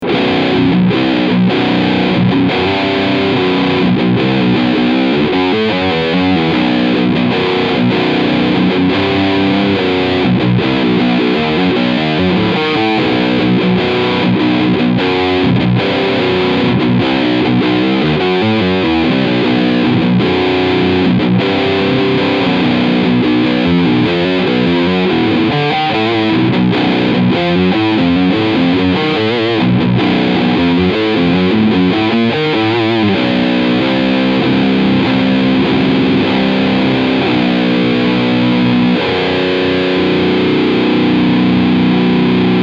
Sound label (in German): 7 String Riff Sound Steg Pickup; Dean Guitars Exile Select